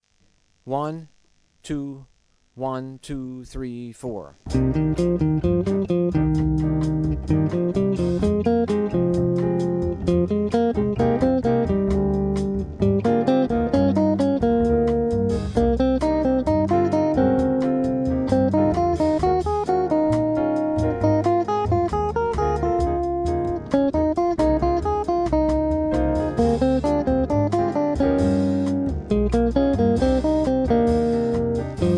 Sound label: Asc; Penta Patterns